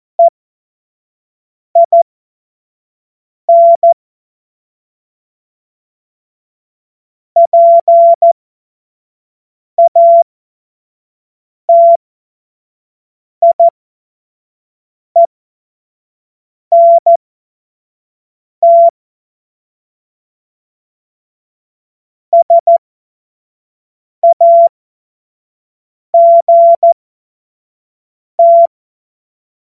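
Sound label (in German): Witz, Morsekurs, cw morsen; Witze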